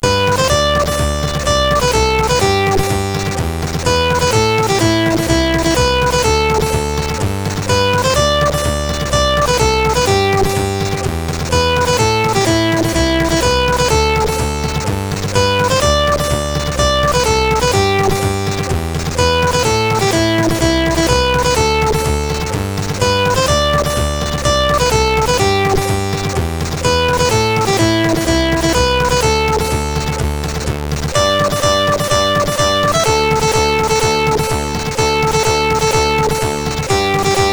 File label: sid CSG8580